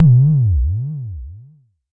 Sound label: Jomox MBase, drum machine; Jomox MBase 01